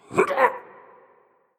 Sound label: hitreact human male small